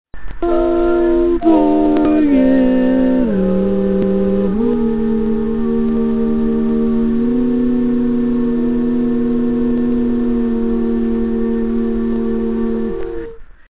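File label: Baritone, barbershop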